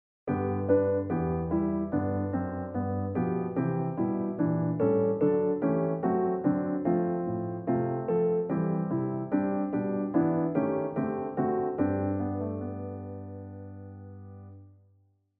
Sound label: Piano